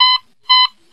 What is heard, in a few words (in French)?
Bruitages Bip